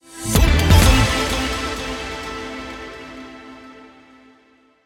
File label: Club Music Sting Win